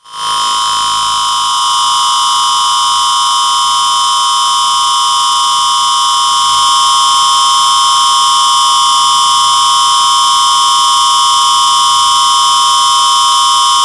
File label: high frequency feedback; sonniss gdc